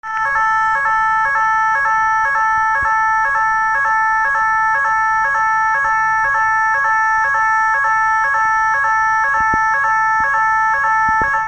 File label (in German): Warnton